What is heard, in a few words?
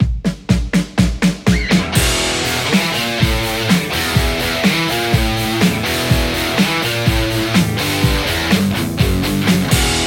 strat; tse x50